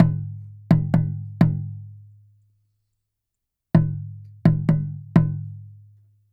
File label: Small Taiko, Asia; Sonic Foundry (Sony Creative Software); Continental Drift Aftershocks